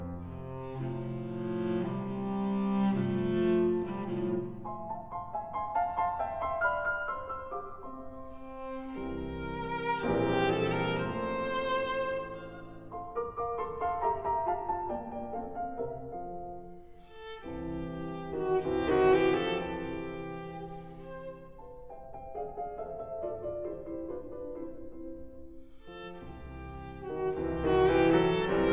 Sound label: Variations for cello & piano in F major